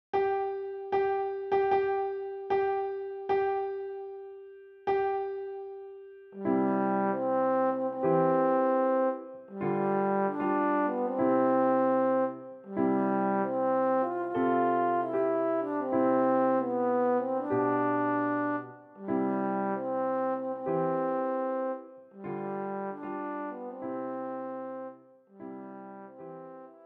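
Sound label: trombone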